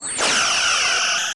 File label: phaser; Weapons; federation